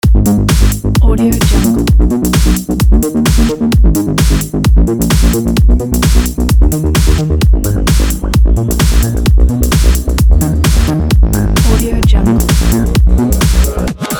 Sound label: house energy logo